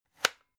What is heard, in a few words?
electric whisk slider close hard; haus-garten; kueche